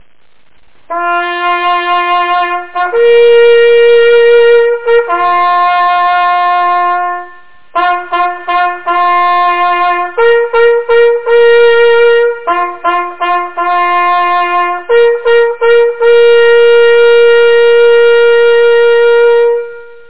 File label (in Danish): jagthorn, Giv agt og samling; Askov Malt Jagtforening